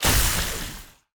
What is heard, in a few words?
enemy attack puddle cast; bloom minigame